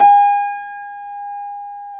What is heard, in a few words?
PNO, Tack Piano; Club-50 - Foundations Roland